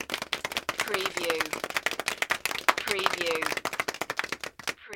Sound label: Small Crowd Clapping